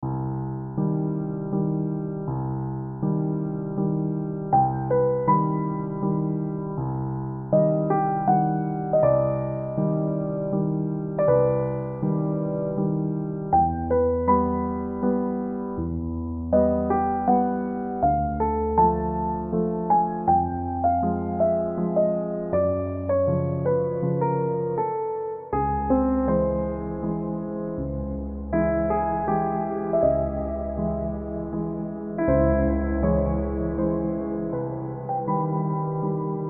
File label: Mystic Waltz; tiny bunny full soundtrack 2019